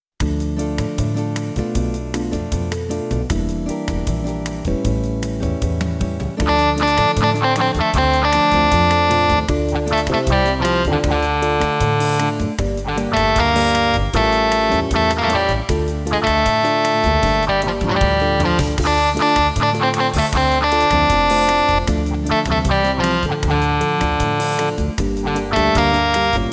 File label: Jazz